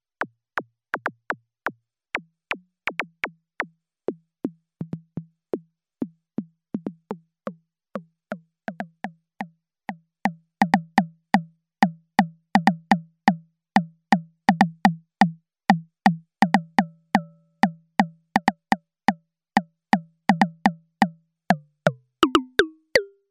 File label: MODOR DR2 RIMSHOT engine